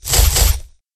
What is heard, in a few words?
cut with sword; jidaigeki